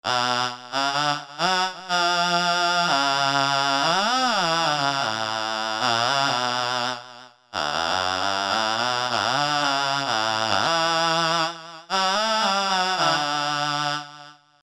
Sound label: Voice, Korg MS2000; Factory Patches